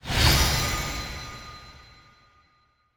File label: Character Heal tail; Sanctuary NefilisStatue